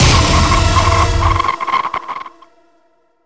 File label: light ray fire; dogma